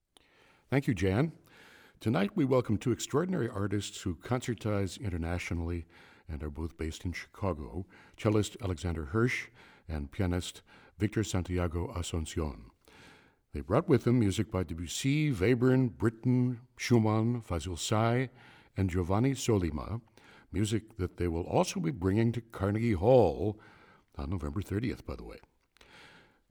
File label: cello, piano; Live From WFMT; Alexander Hersh cello; Victor Santiago Asuncion piano